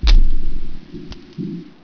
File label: door stop